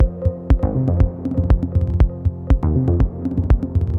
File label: boss loop; Smash Hit